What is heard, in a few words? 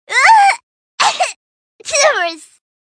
death